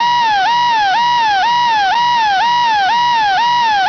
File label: alarm